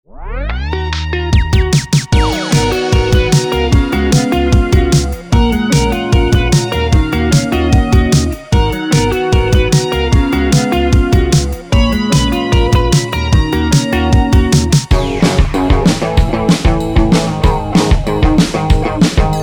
indie beats sample pack; indie beats 4; prod kult x rfm beats

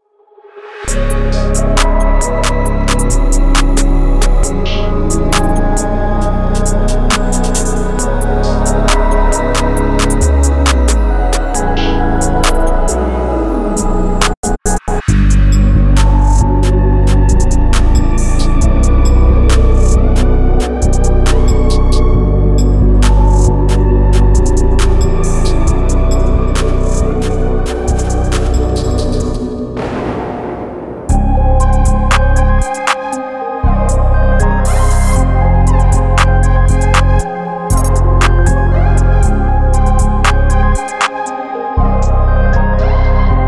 ambient experimental; maak lovemusic

